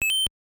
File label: Move Cursor (Map); super mario bros. 3 1988 nes